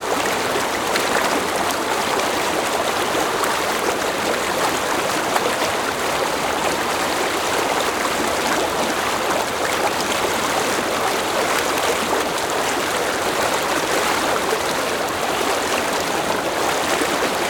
Dam, water, flowing, splashing, waves, clucking, movement; Double Trouble Audio